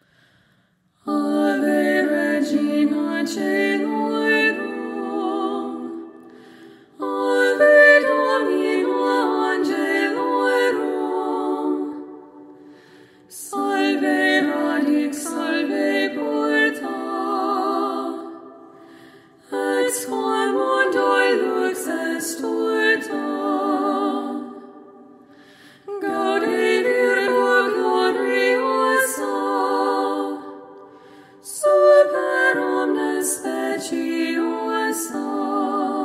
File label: Ave Regina Caelorum Hail Queen of Heaven Marian Antiphon Lent Acapella Gregorian Chant; prieres a la vierge marie